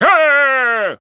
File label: Staff Slam Hit; Wizard